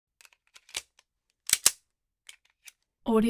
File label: springfield 1911 45 mag in slide mag out; soundmorph